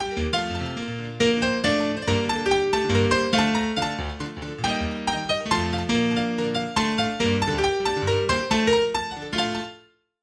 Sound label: piano version